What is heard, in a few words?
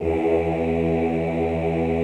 OOH E1, Classical Choir; Best Service; Extended Classical Choir